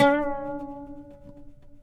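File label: pluck OUD; Roland - LA Composer vol. 3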